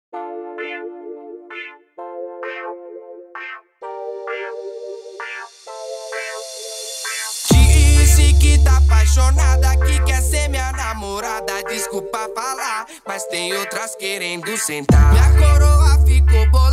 especial funk, cd

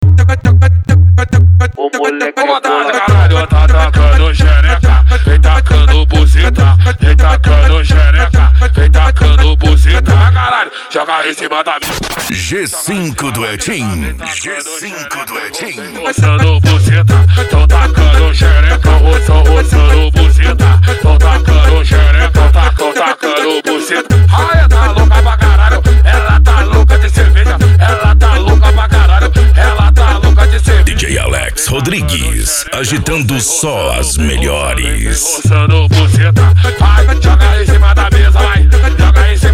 mega funk e funk mega bass

